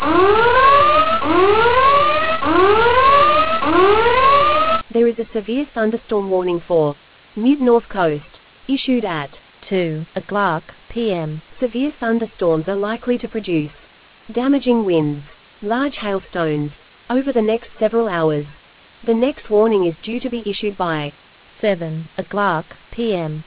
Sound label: storm audio